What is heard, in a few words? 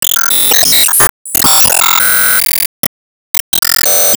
Sys Topleague Reward Season